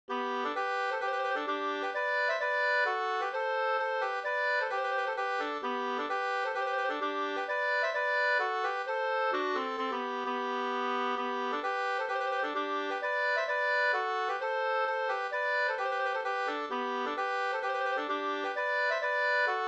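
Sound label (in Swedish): polska, tvåstämmig; Tasjo; Per Bolin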